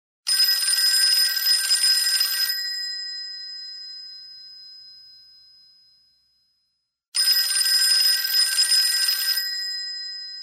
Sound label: classic, ringtones